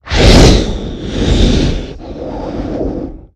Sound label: eerie breath composite; Sound Design - Horror; Sony Pictures Sound Effects Series Volumes 1-10; Sony Creative Software